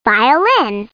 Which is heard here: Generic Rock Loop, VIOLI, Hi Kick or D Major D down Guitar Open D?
VIOLI